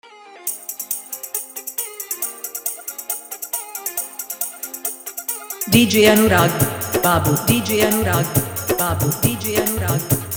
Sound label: Full Hard Dhollki Bass Dance Mix